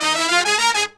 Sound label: Phantom Horns, 96BPM; 90 s Sample CDs; Zero-G